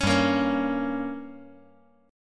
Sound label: G Diminished